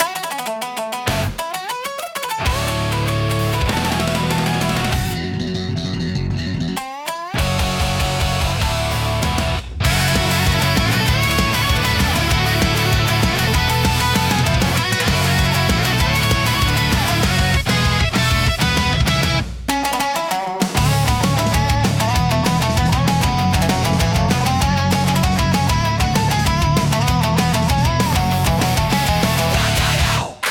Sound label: Three-String Riot, intense japanese rock